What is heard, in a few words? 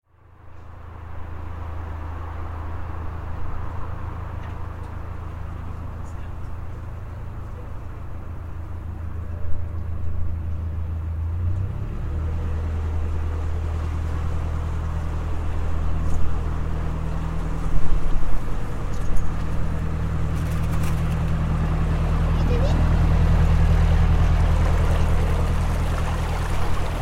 narrow boat